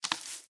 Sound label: Tree Canopy; Blind Quest - The Frost Demon